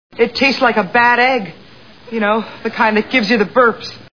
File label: egg burps